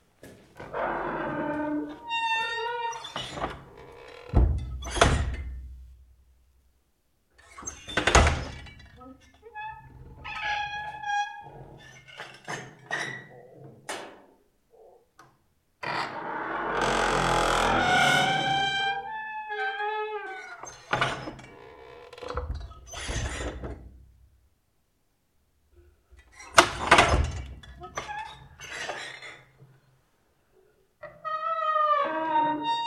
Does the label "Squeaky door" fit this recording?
Yes